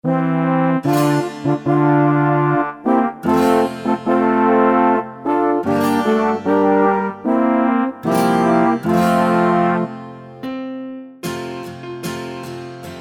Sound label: Karaoke